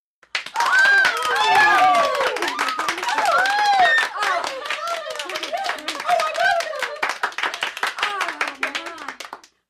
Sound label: 10 Women Clap Loud Ooh